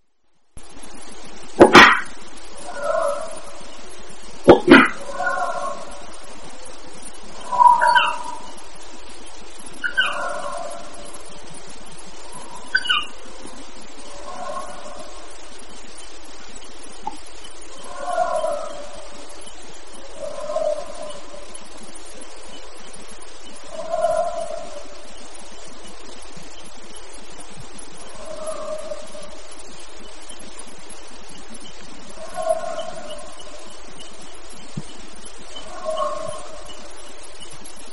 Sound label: howls louder